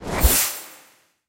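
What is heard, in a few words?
debrief achievement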